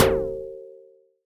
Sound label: Alien FM Perc Med; Ableton; Noise Garden - Augmented Percussion; Alien FM Perc Vol. 1